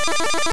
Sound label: doorway; Super Mario Land